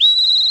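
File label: WHISTLE; CMI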